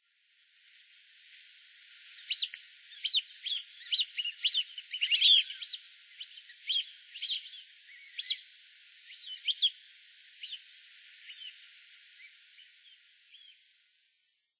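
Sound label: Eurasian Oystercatcher, sanbanse; miyakodori; koe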